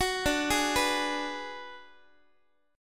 D 6th Flat 5th D down Guitar, chord; D6b5 arpeggiated; x,x,9,12,12,10; tuning 4A,4E,5A,5D,5G#,6C#

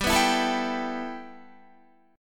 G 6th Add 9th G Guitar, chord; G6add9 strummed; 14,14,16,14,16,15

